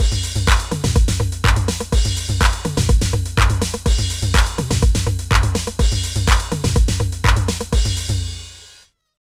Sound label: TR-8 pitch